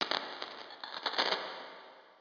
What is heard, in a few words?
teleport; ゆめ2っき